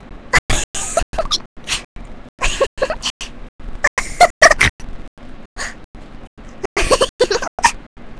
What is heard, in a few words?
Various giggles